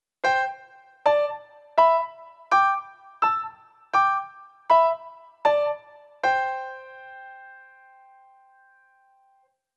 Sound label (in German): CHINESISCHE QUINTEN c g TRP